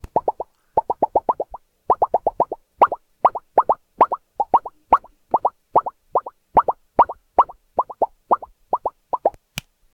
bolha estourando japonese, bubble

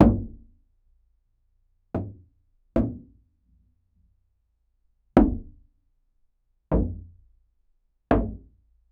bater num sofa

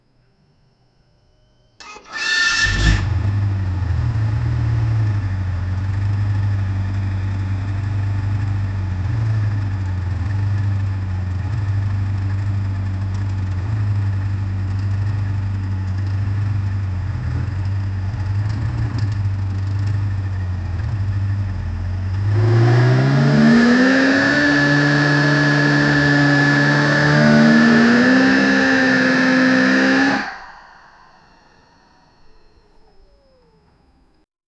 motor W18 com sistema de

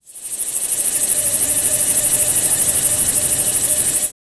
lasers estalos de luzes n, nightlife

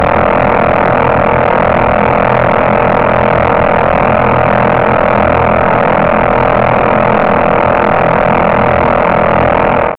um som de video game, energy sphere

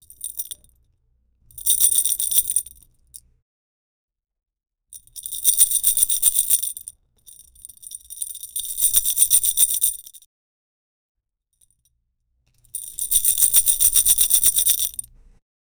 som ambiente de camioes, kids playing

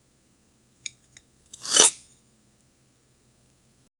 udio realista de empada, asmr

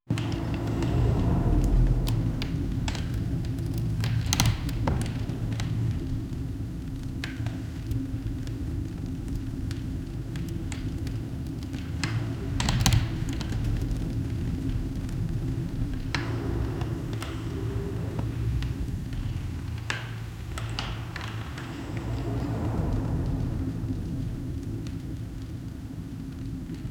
chuva suave em telhado de, cozy

